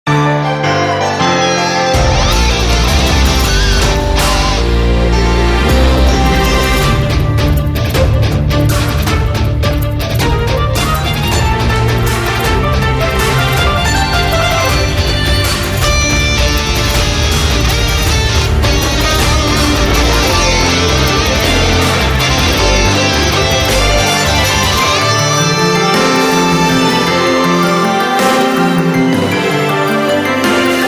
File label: Battle BGM